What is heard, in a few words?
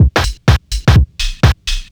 muff diver, Deep House 125bpm